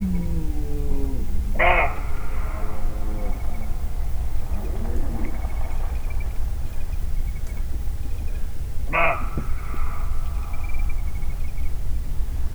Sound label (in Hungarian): őzkiáltás; termeszetben